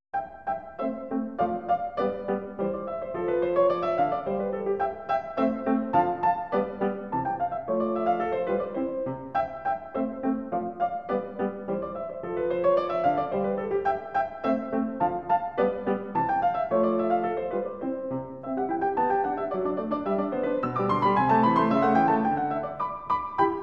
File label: Piano Sonata in C major, Allegro; Mozart; K.545 III